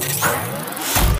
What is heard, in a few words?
Servo Jog; Titanfall 2